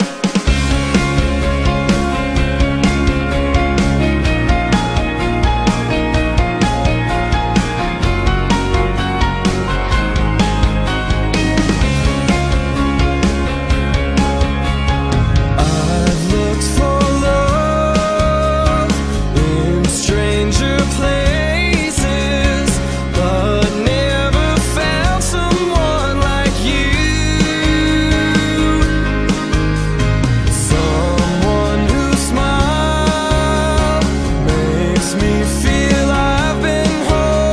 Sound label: Ringtone